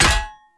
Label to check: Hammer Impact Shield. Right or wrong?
Right